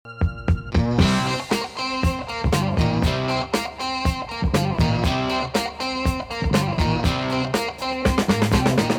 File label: music